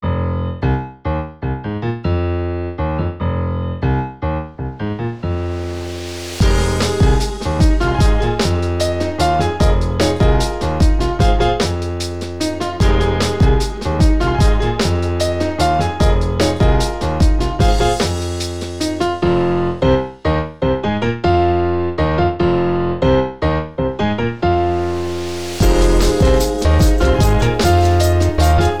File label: F Minor Jazz Funk